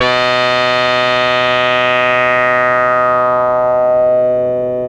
Anasound Long mid B, Analog Single Notes; Sonic Foundry (Sony Creative Software)

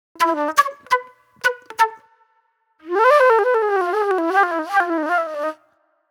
Super Soul Flute

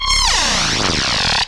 fx scifi; Ueberschall; Techno Trance Essentials

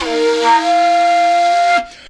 FLU + VOC, FLUTE + VOCAL

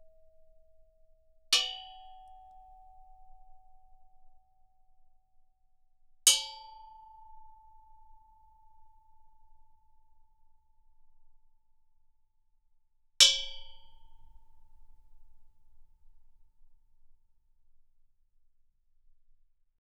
Gamelan; Propeller Island - Complete Gamelan

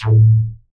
WET BASS; Masterbits; Dance Trax

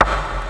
Kick, Fairlight CMI III

